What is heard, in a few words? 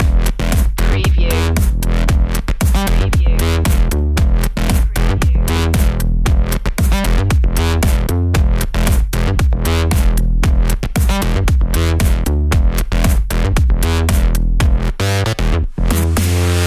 cosmic funk loop